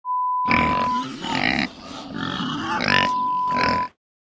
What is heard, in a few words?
Pigs sound